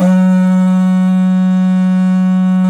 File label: F#2 HORN, Didgeridoo; Big Fish Audio; DIDGEHORN1